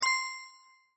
jackpot tile; Skillzgaming; fruit Blast 92 Desktop

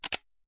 Menu Click; Wu Xing; genesis

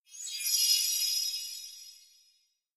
heals; Skillzgaming; battle Mania Desktop

